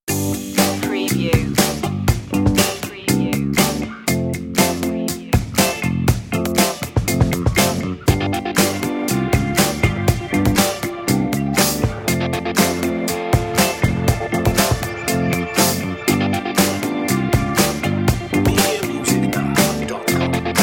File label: easy funky